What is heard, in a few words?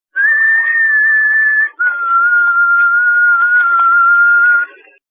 whistle; negan